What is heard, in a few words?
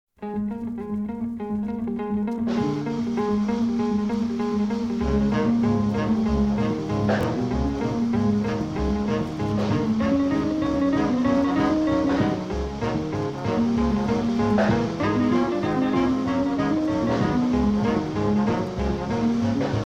pblues